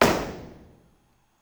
Palloncino; Ramsete

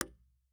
ir f sub pannello legno; magnetostrittivi; dml-legno+stucco

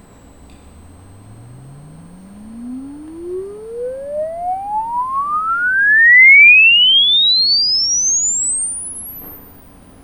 Tromba sweep; Siracusa

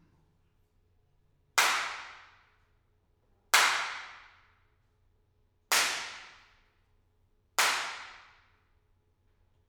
Clappatore

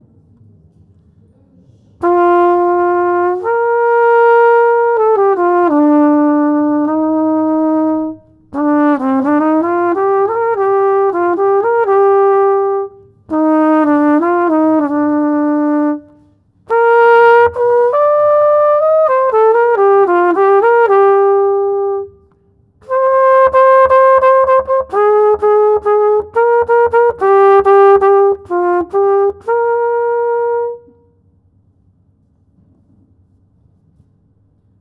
Tromba deconvoluta microfono; Siracusa

